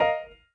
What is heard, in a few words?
windows xp default